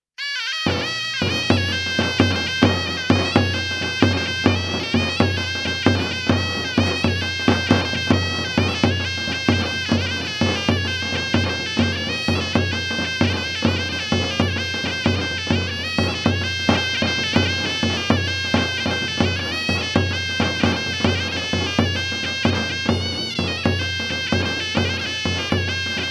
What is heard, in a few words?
kurdish music sorna; iran